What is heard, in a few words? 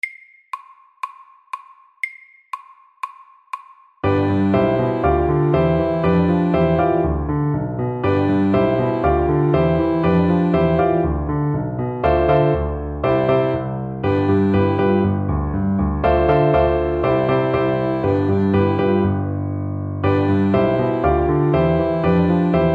Honky tonk SAKTE